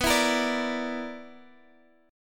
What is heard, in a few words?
B Minor Major 7th Double Flat 5th B, Guitar, Standard; strummed; capo 3; 19,19,20,19,x,x